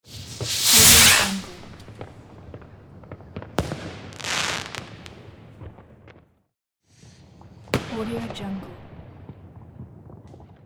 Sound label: fireworks